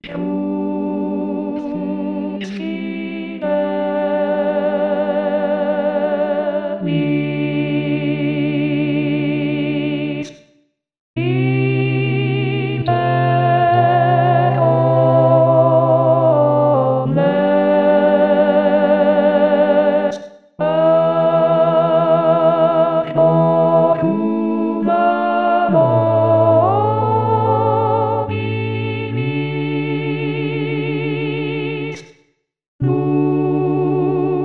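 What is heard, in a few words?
Crux Fidelis Alto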